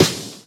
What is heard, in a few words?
Claps & Snares